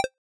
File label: toggle off, retro